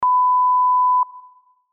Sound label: sarmat start, subway trains; MetrostroiAddon; 722